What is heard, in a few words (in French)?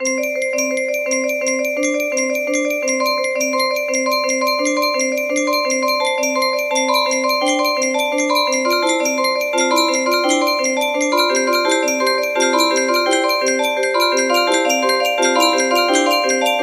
boite a musique